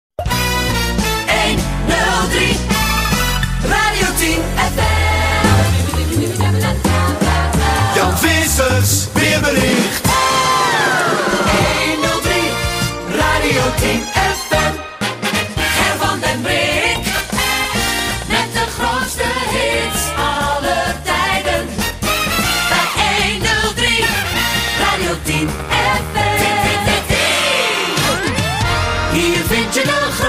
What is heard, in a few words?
jingles; Radio10 FM